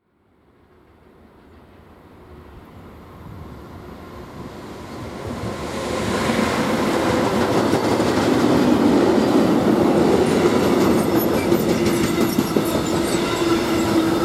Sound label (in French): trains diesel et electriques; sonotheque